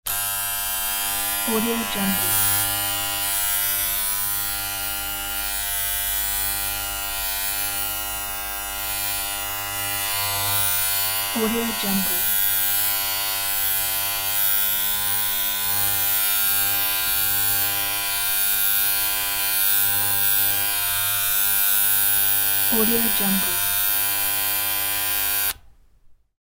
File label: electric razor buzzing